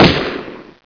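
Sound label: fire machine gun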